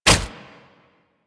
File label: Nunchuck Catch, Weapons; Warframe; Infested